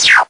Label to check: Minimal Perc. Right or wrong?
Right